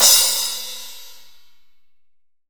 16 CRS, S DCY CYMBAL

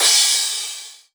Crash, Cymbals

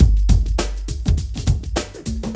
102 BPM

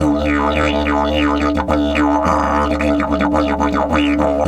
108, DIDGE 108BPM

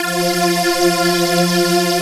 AIR VOX F3; AKAI S-Series CD-ROM Sound Library VOL-7; AIR VOICE 1

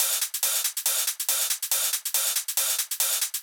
drum Loop 140BPM Full

